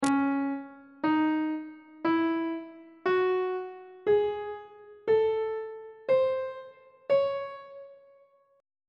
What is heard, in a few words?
d flat harmonic minor scale note positions on treble clef